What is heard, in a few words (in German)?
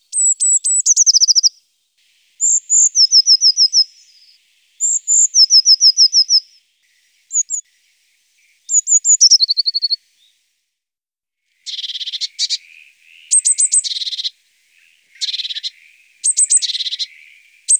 Blaumeise